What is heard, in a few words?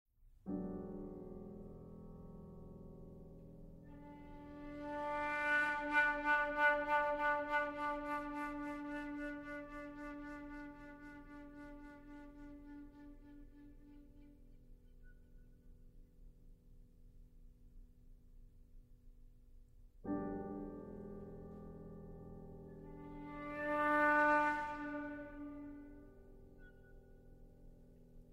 Duo For Flute Piano; A Mystery